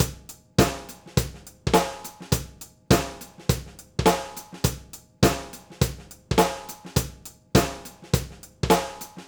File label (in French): overhead, batterie; audioctone; km-8p; kit-microphone